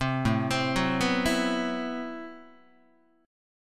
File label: A Minor 6th Add 9th Guitar, chord; Am6add9 arpeggiated; 3,5,3,4,3,3; JGuitar